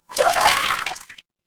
water swirl

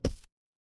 impact thud

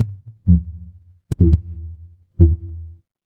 fire electric hum

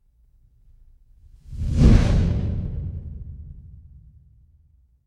fire electric whoosh flame

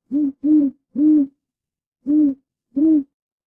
animal owl

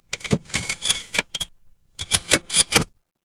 mechanical lever

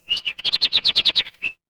animal bird

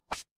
ui swipe

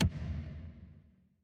transition impact hit

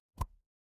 ui pop

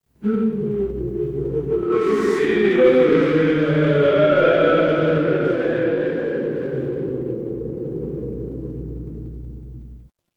crowd cheer

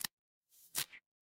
cartoon stretch

